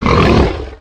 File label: boar aggressive